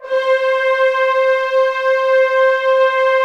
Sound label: ORC C4 FF, Orchestra; Sound On Sound - Peter Siedleczek's Orchestra